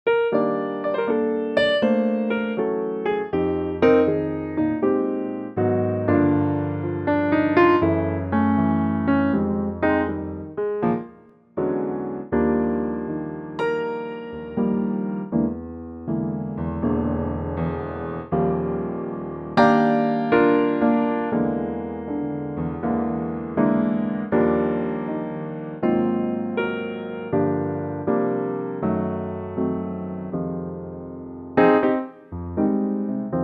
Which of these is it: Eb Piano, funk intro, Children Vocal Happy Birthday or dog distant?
Eb Piano